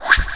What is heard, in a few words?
Spear Swoosh; Weekend Warrior; Mayan